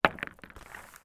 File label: sport pool snooker last balls place in rack triangle slide into place on table